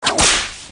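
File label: whip; fouet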